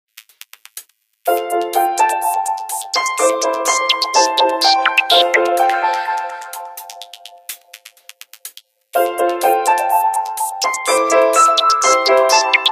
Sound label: Melody Song; Microsoft Windows-Phone-7.1